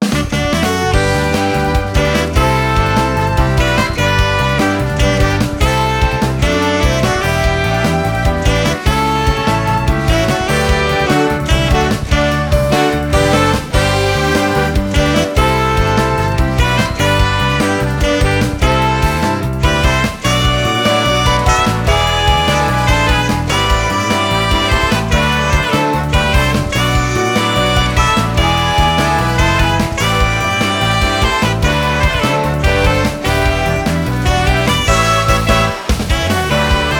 Music event; Power Pro Kun Pocket R

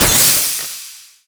Enemy Jet Skill Shield Break; gamerip; Disney Star Smash; Android, iOS, Mobile

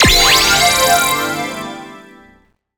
success

